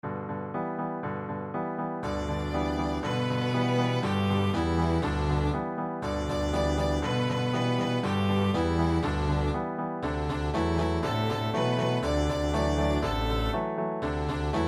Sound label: banjo tune string and accomp